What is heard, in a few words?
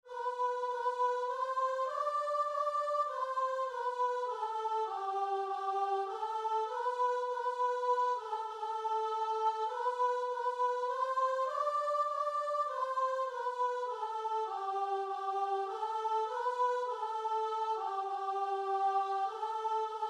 joyful joyful, guitar and vocal